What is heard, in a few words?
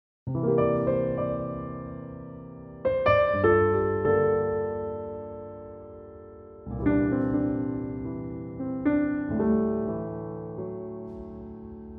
PIANO SENSITIF